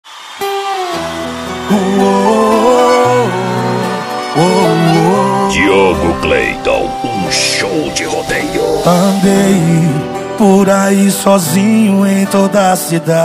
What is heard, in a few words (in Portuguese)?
sertanejo com locutores de rodeio